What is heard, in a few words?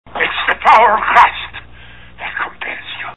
power; thewatcherscouncil; season two